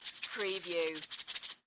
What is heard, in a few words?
Seasoning Bottle Shake; sound effects bible